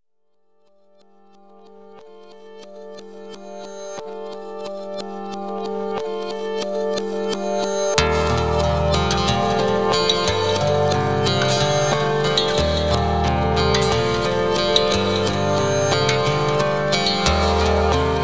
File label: Emotive-Beautiful-layered acoustic guitars; CUES for film TV games etc POSITIVE